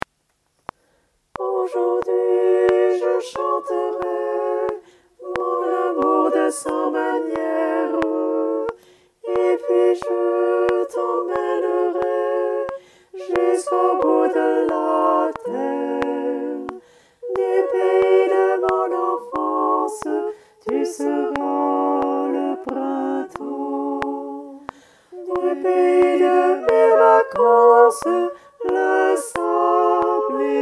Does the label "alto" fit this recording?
Yes